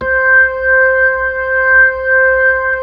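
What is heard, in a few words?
B-3 Club crossfade, Keys of the 60s and 70s; Roland LCDP10 Keys of the 60s and 70s 2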